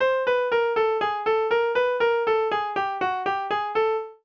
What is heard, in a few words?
Chromatic; First Steps